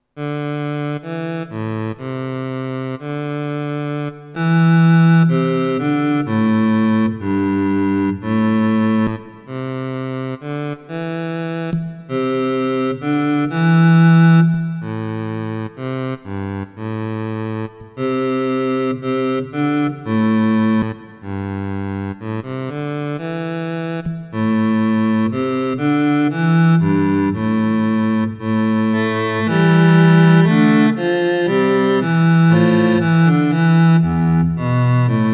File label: string filter